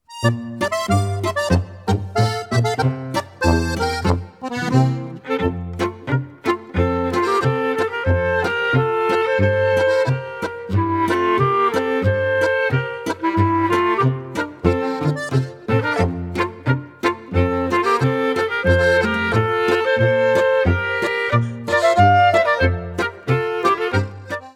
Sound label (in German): boarischer; rossbrand